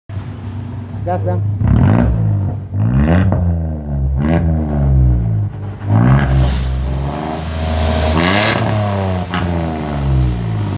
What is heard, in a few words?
Datsun GO; ماشین